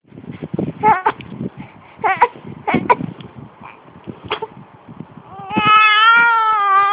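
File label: baby hungry, male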